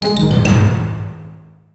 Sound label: Stinger Down; Deal or No Deal Special Edition; NDS